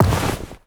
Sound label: robes equip, inventory